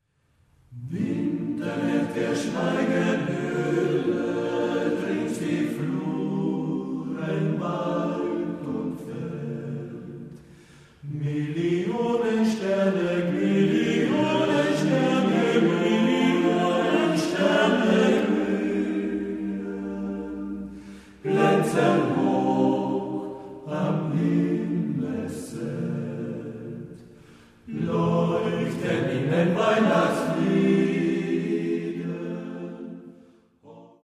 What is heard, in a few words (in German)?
Weihnachtsglocken